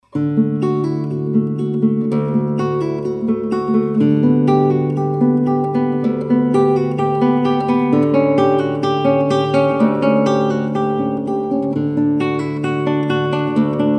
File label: prelude cello I